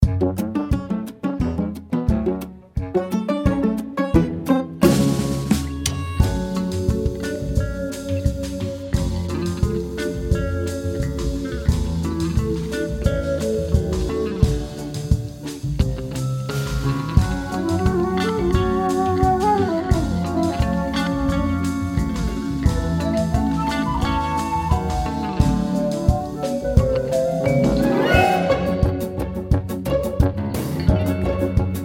Bgm Ingame

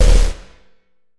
Steyr; Cruelty Squad

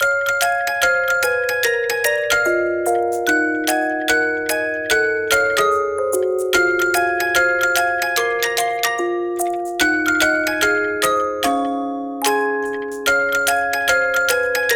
Song (Music Box Arrangement); Hohwaka Family -Nekotomo Song; Tomo Series Soundtrack

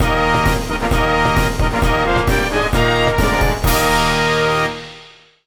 victory; Krinkle Krusher; PS Vita; PS3

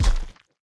Eyeboss Wallbump; Super Mombo Quest

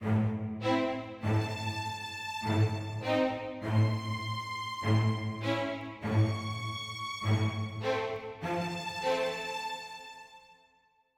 mysterious forte start

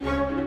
Music Dialogue Danger Start 64bpm

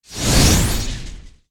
Vortex Open; Puddle; puddle plus ios gamerip 2018

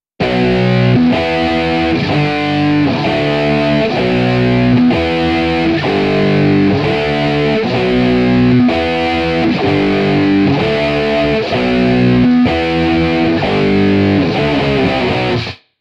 Guitar Dub

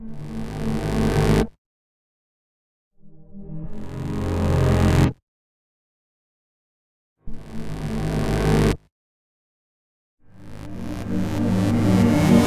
mood freaky

